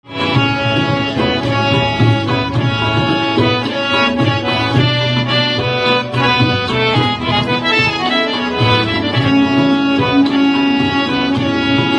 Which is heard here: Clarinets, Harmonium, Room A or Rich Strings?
Harmonium